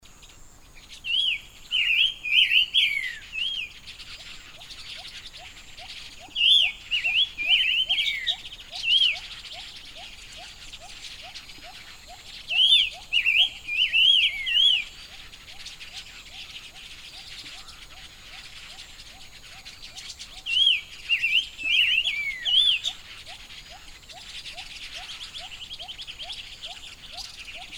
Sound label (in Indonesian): robin pancawarna call